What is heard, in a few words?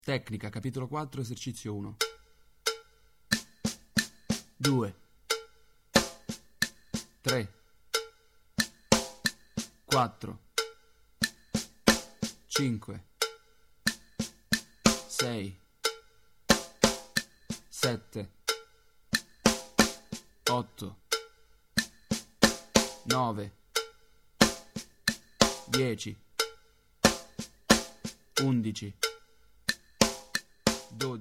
Drumsystem